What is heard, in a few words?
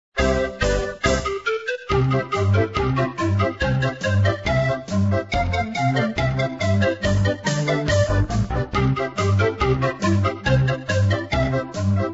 polka